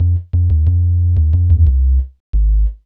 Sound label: Tubble Bass; Zero-G Smoke